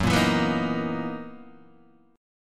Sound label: F sharp 13th F sharp down Guitar DADGAD